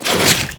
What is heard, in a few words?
Inf Transform Claws Whoosh, Infested; Warframe; Weapons